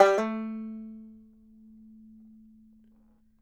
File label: BANJO NOTES, A 3; Sampleheads - Whole Lotta Country 1 & 2